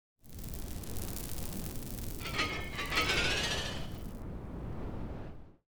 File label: crunch zones 3D